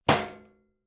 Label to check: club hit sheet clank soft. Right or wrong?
Right